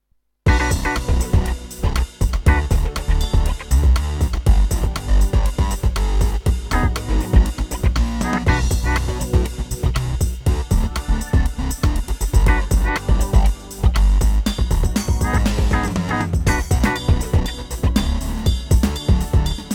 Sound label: background; RS Games Sounds; Shut The Box